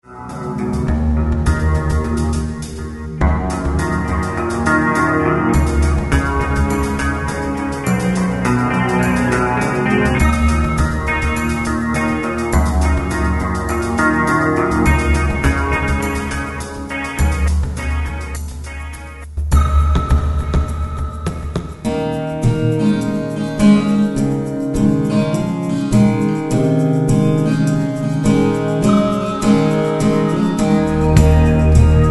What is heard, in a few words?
Trance